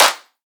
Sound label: Riddim Clap